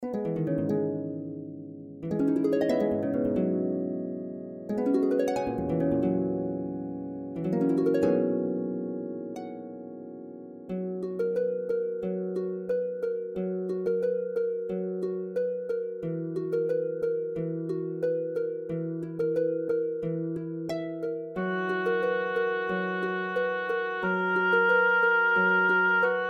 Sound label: Orchestration; Lake Orchestration